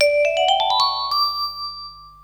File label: magic bell mix